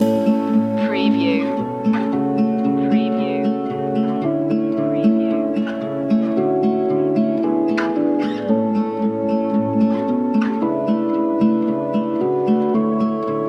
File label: walking home loop